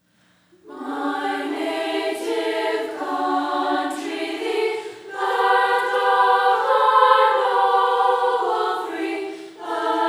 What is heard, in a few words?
Childrens Choir